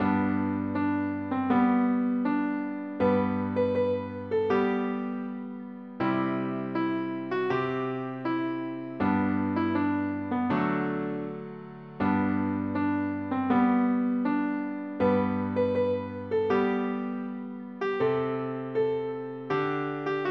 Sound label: piano slow; slow tempo; PSE Music; PlayScore version